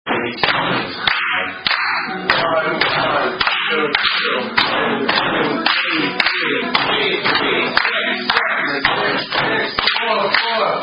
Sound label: Drinking Game; Zoomy Zoomy Drinking Game